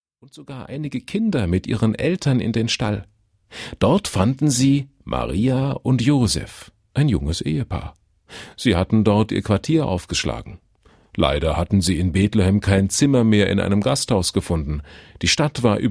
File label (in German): Zwischenmusik